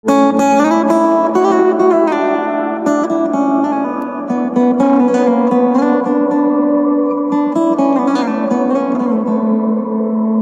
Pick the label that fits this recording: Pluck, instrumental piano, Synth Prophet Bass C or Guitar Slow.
Guitar Slow